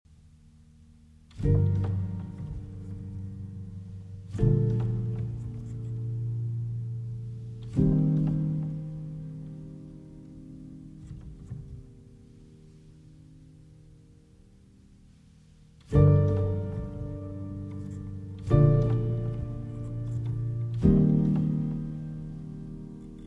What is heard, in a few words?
Grand Piano Version